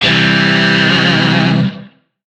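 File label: Shawzin Major Chord; Warframe; Lizzie Shawzin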